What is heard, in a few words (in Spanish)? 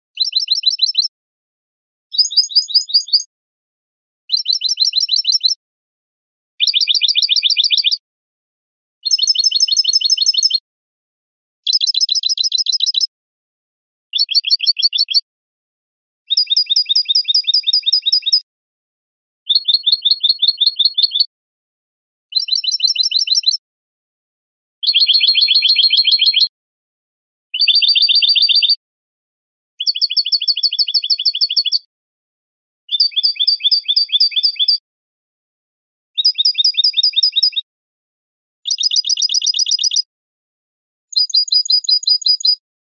bibleo martilleado